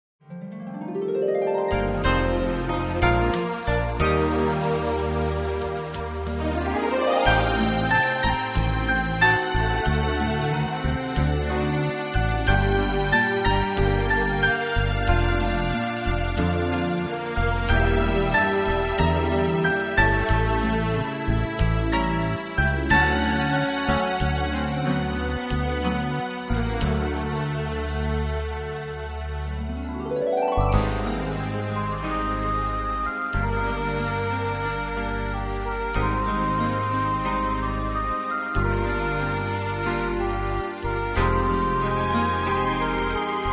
Easy Listening & Classical; Sound Ideas

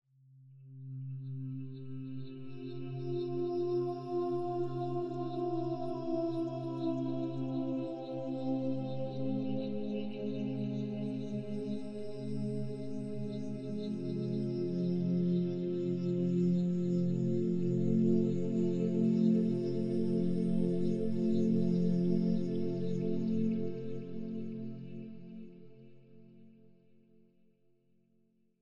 Ambiance, dreamy emotional

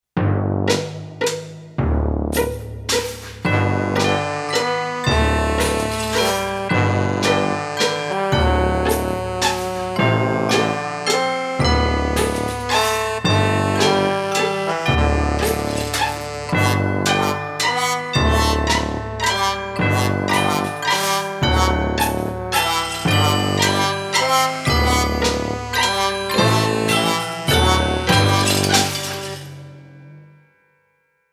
Comedy Style; Sound Ideas - Comedy Styles

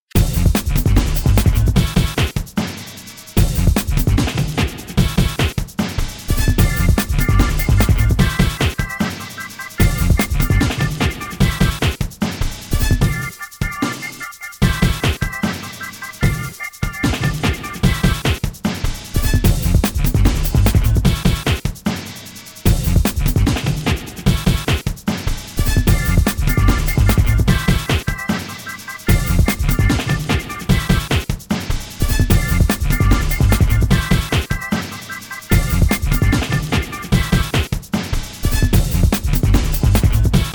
bed cool bed

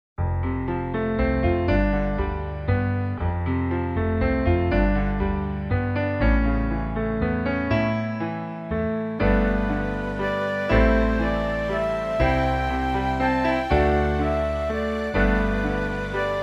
Easy Listening & Classical; Sound Ideas

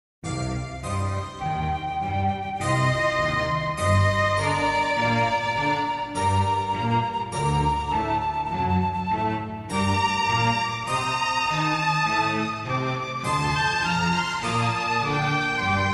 Easy Listening & Classical; Sound Ideas

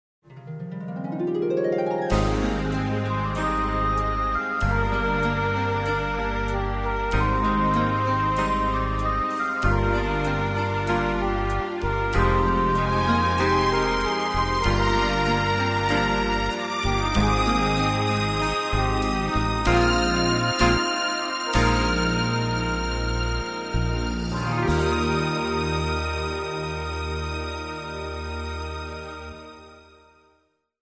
Easy Listening & Classical; Sound Ideas

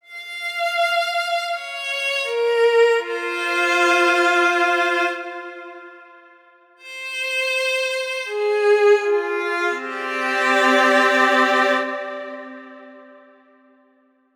Plaintive, Violin medly

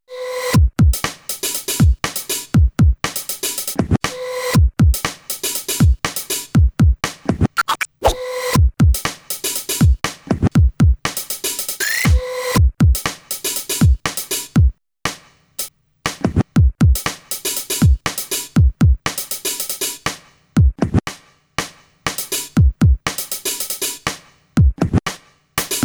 bed simple effected

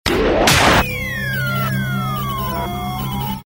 Galery Noise; Sound Ideas - Galery Noise 002

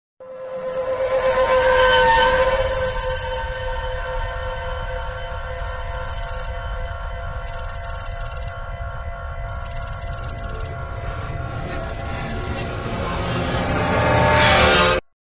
Galery Noise; Sound Ideas - Galery Noise 002

